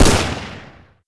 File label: oicw fire, weapons; npc